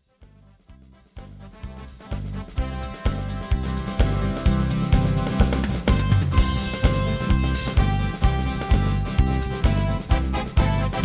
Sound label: Samba Medley